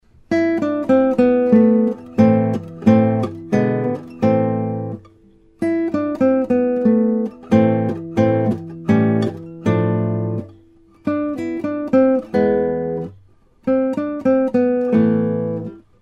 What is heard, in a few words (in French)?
pour guitare; Sauter, Louis